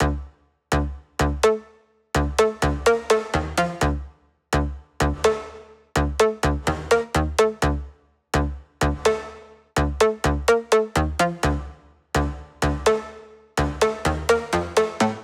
126 synth loop Dmin; barley

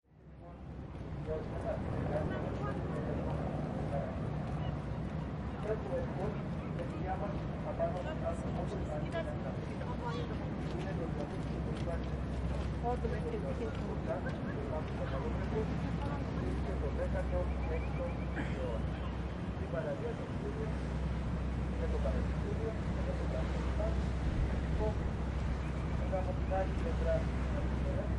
Atatük Kôprüsü Bridge (With Metro Passing By)

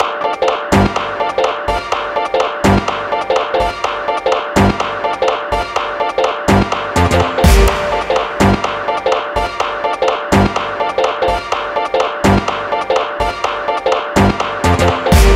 MUSIC LOOP GM (125 BPM)

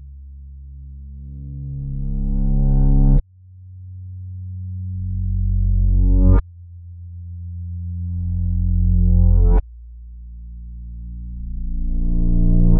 75, Rev Bass Loop

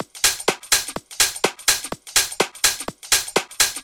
Drum 125bpm No Kick

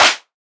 Clap Shot Dry A

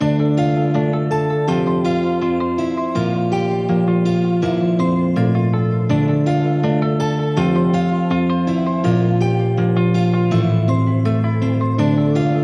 163 BPM Dm; Know You

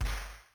Snare; LM2; Zenhiser

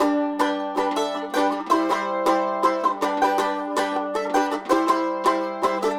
80 G Resonator Mandolin

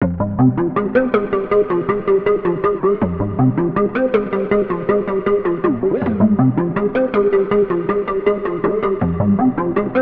160 pluck Fmin; cherry

